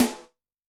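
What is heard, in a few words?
Buzz Ring Rim, Yamaha DTXpress-II snare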